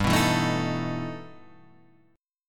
E Minor Major 11th G, guitar

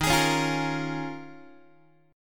D sharp 9th Suspended 4th D sharp, Guitar; strummed; x,11,11,11,9,9; 4A,4E,5A,5D,5G#,6C#; JGuitar

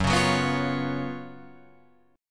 F sharp Major 7th Suspended 4th, Guitar, Open F; F#M7sus4 strummed; 6,8,6,6,8,8

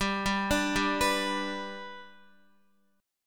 G Major, Guitar; G arpeggiated; JGuitar

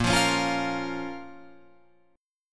A sharp Minor, Guitar Open F; strummed; JGuitar

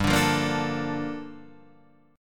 Bb Major 7th, G; Bbmaj7/G; strummed; JGuitar

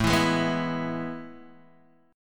A Major 7th Suspended 2nd, Guitar, Open D; chord

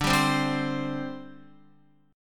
D 7th Suspended 4th, down, Guitar; strummed; JGuitar

